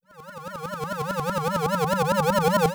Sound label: Zoom Down, 8-Bit; Games And 8-Bit Kit Volume2; atomsplitteraudio; chiptune